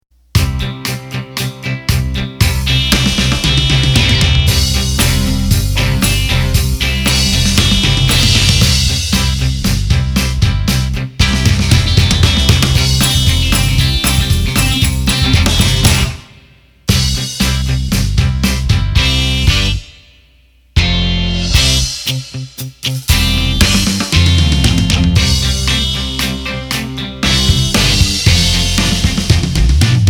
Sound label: Smooth Rock; roland bk7m